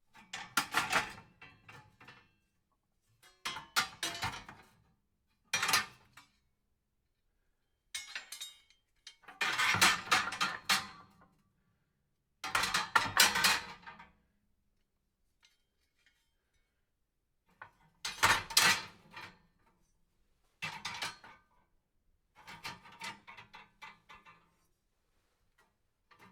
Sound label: fireplace tools, household